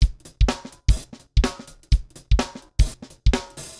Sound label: Dance Music